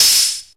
ANALOG GIANT; OMI - Universe of Sounds

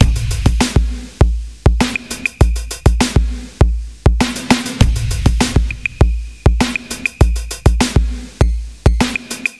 100 Beat; Warhead; Zero-G - Future Wave

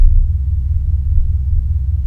Korg, FX

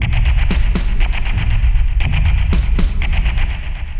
Drama Drums (120BPM); musicradar

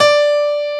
STUDY PNO; East West; Global Sounds

